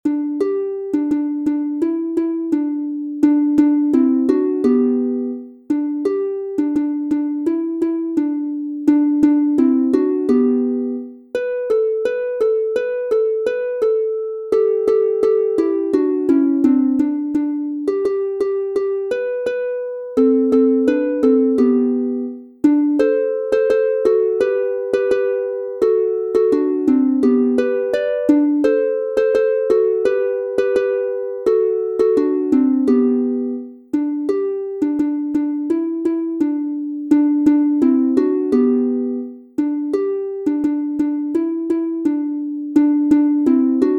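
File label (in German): G Dur 2 stimmig instrumental, Harfe; Das Wasser ist so hell und klar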